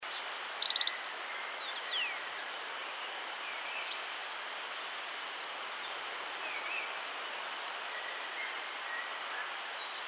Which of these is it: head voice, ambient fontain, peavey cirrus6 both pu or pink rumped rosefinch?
pink rumped rosefinch